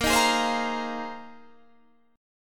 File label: Bb 9th Suspended 4th Bb down Guitar Open D